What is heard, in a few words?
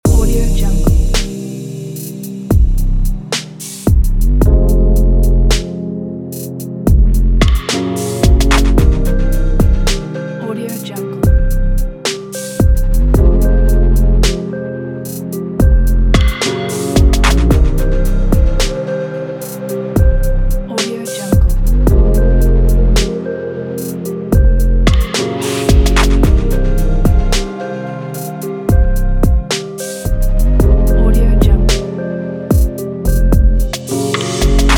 trap noir